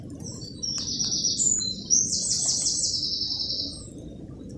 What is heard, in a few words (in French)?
troglodyte mignon; Troglodyte mignon observé avec l'app BirdNET 2022-03-20 16:52:45 à Cléguer; voix du troglodite